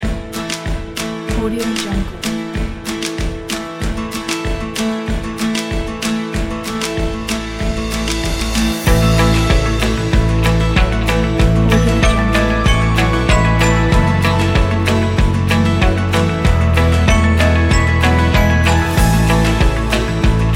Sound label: inspiring acoustic indie folk